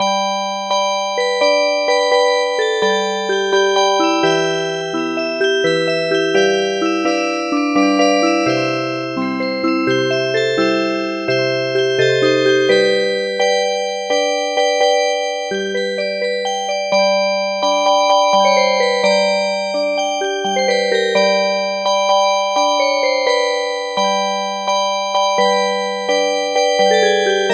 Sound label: Music Box, midi